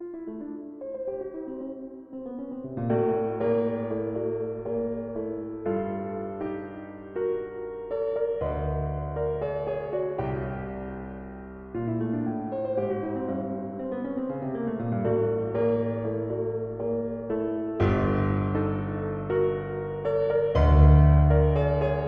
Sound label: Quarter-tone Piano; Quarter-tone Piano Prelude No. 9